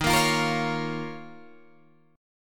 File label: Eb 7th Suspended 2nd Eb, Guitar Drop D